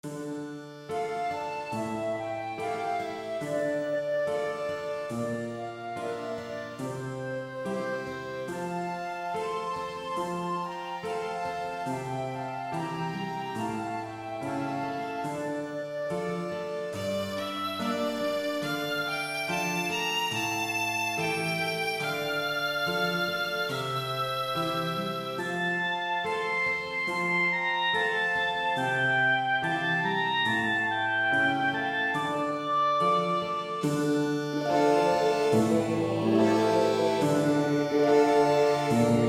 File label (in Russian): Фонограмма ансамбля Украинская мелодия